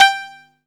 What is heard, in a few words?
PERC G4; OBMX